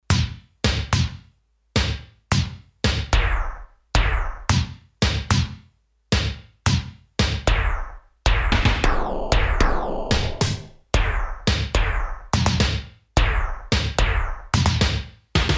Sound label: Cave'n Drum, Roland D-10; Factory Patches